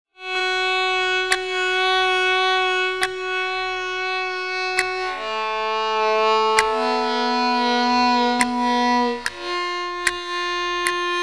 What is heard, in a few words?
Castanets Violin